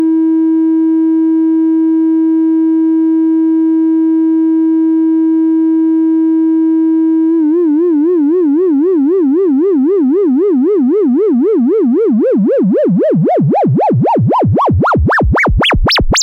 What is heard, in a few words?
cali osc log fm, synth